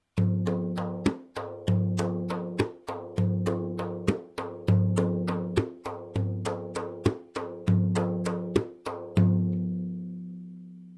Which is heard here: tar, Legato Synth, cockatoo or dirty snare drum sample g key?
tar